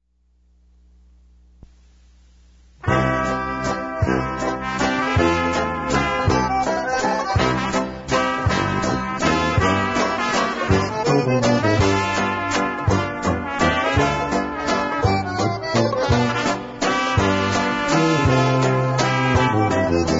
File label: waltz